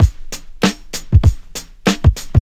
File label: house loop